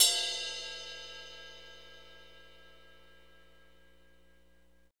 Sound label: cymbal, fast shuffle, Roland; Northstar; Drumscapes Roland; Cymbals